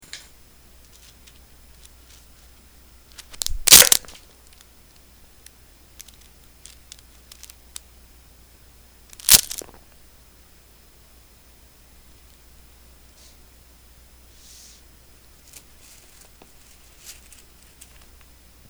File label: snap